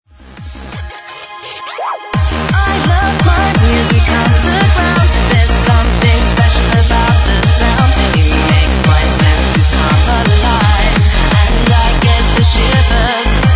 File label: hardcore